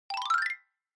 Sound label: Xylophone Fast Long 3 Up